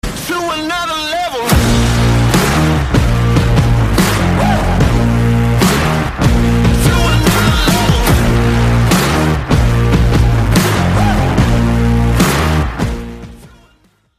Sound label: epic rock